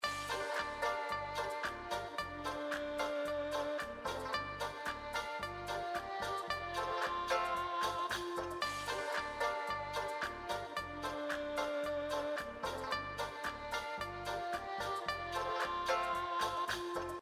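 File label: Background Music INFO, SLOTS; Magic Coin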